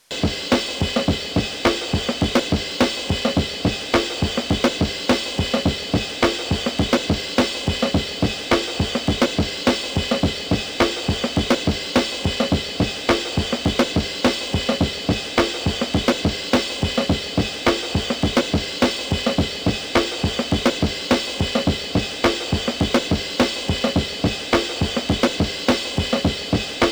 suspicious drums

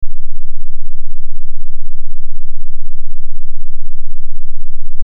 sinusoidale 8hz freq

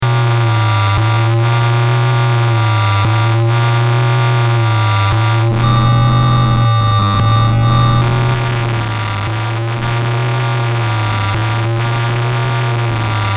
noise

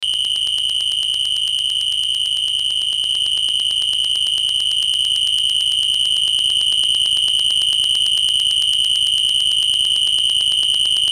inharmonicity of overtones

